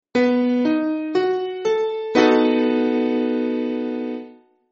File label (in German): H dur 7, konzertina; kunstklang